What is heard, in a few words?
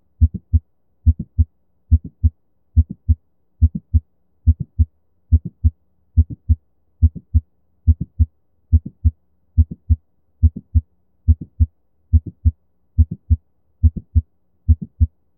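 Apex, Mid Systolic Click, Supine, Bell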